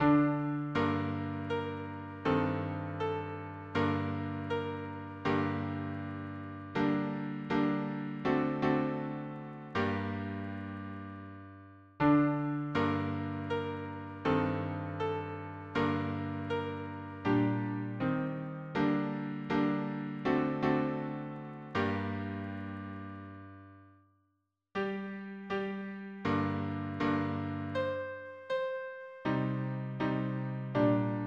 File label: harmony piano